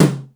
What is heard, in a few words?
RIM TOM H, DRUMS; East West - Global Sounds